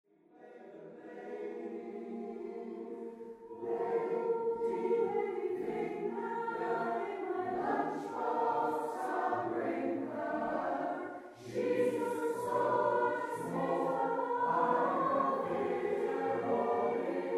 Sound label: SATB